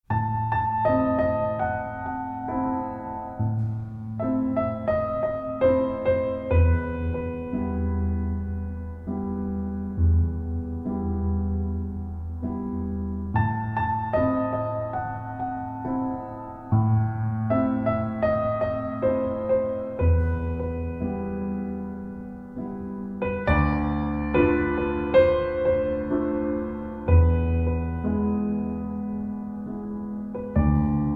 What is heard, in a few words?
Gnossiennes No. 3 - Lent, Piano